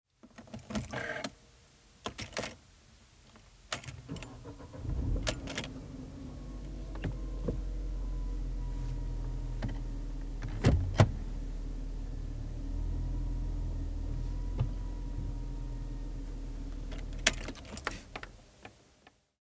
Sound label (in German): Auto innen starten